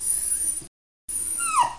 chien; Modern Art; FR

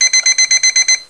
reveil; MOBICLIC; langues

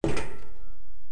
pas metal; Time Machine; Bruitages

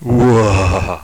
cri; Mobiclic46; LANGUES

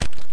pas hom humid; WehikulCzasu; Time Machine; Bruitages

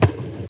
crabe

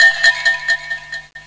bulle; Emme Arc En Ciel Et Le Lagon Merveilleux

